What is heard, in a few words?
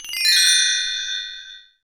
Bells Chimes, PRC BTREE; NorthStar - Global Instruments VOL-2